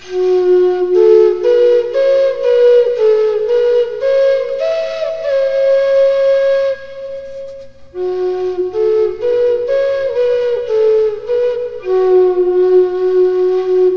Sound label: Tuned Prototype, Flutes